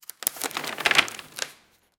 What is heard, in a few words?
paper